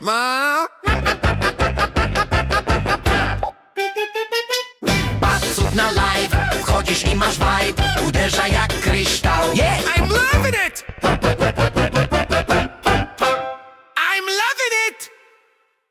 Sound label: Jingle